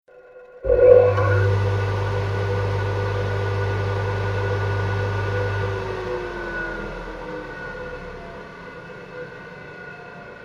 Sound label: Industrial Mechanical Electric Fan Start Short Run Stop Long Wind Down